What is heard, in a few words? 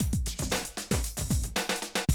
Classic Drum Loops